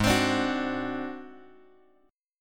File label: G sharp 7th Flat 5th G sharp, chord; G#7b5 Chord {4 X X 5 3 2} strummed